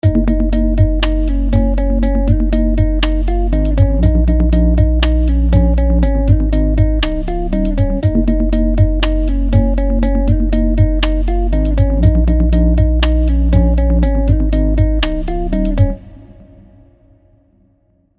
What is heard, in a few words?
Rhythm Baseline; CrushShaper